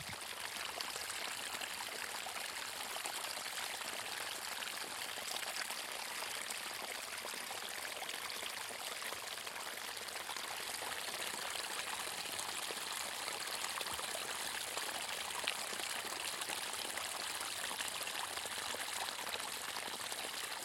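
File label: running water on the street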